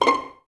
Violins C6 Pizz, String Ensembles; Miroslav Vitous; 11 Violins

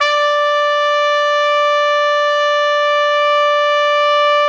D4, Pop Brass; Best Service ProSamples vol.19; AKAI

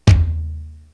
drum, EMU Proteus; Proteus1

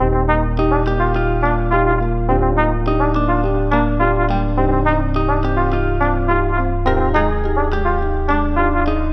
D#maj 105BPM, Music Loops; 99Sounds; Monosounds; PANDORA BOX!; Miami Beach

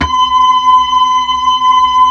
B-3, Blues

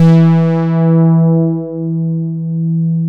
MODULAR E4, MODULARBS

